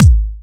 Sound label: Electro Kicks